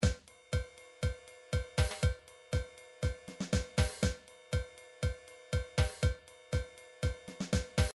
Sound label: midi drums, tango